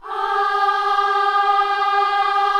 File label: KIDS CHOIR, Gospel